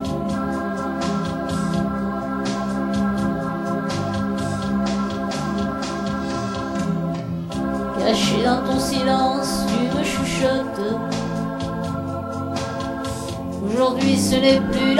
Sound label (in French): blues de nature